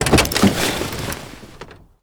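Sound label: player door open; Modern Warfare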